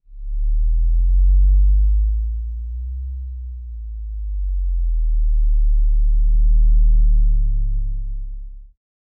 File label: second turning on devic